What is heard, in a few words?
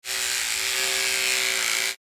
Electric razor beard trimmer